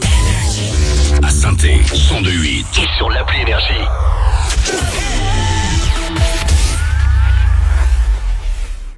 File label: JINGLE; SAINT ETIENNE, 102.8 FM; NRJ